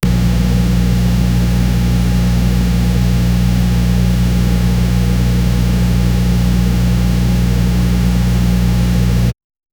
playstation 2 bios start, computer

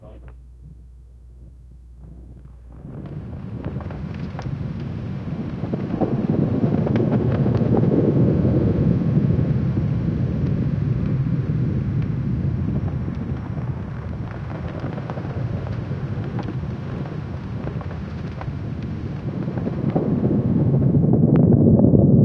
erupting volcano rumbles; eruption

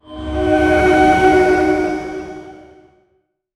magic

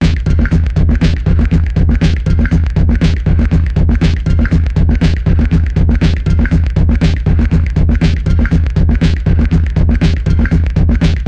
make alien boss music tha, beat drop

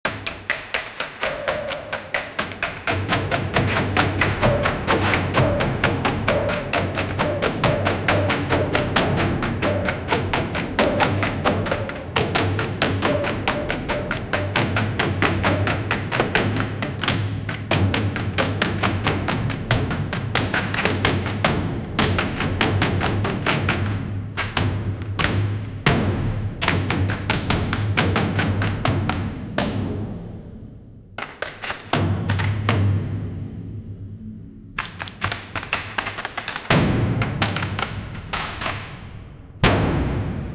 background ambient; beating

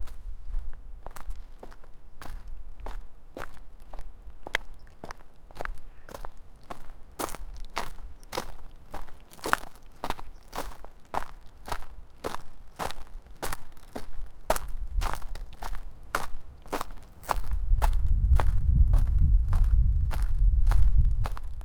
human foot steps walking; environment

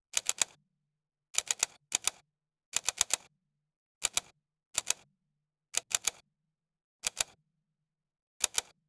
minimal rotating camera; monochrome

